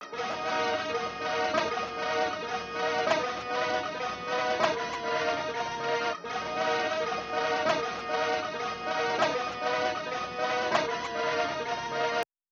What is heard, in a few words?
157 BPM D# Min Synth; Melody; Loop Stems; Cymatics; 2022 Melody Collection Platinum Expansion - Pt 2; Psychic